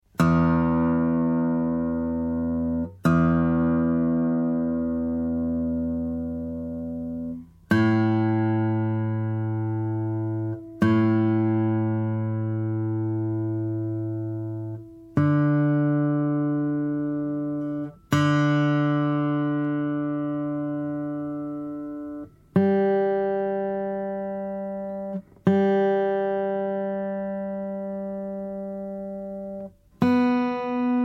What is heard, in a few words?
Tuning Notes